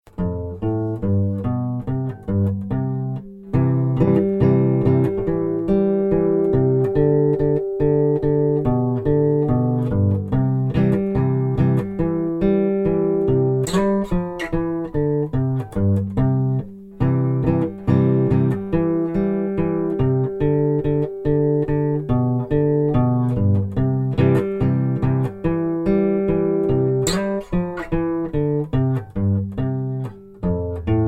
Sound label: song no vocals; Song - music only; Macedonian06. Numbers 1 - 10